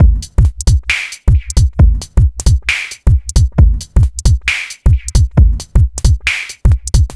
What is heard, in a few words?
Bpm134 Break, Dubstep, Drum Loops; Traffic